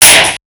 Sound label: Snares, Alesis Performance Pad